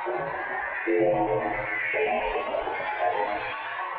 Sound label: Tonal Re Draw, sparse soundscape